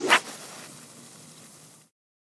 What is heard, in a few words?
casting line, fishing; UltimateFishing2020